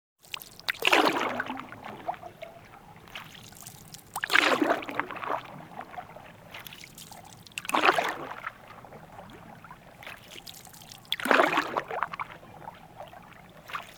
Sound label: Canoe Paddle Light Paddling In Canoe; Electa Quinney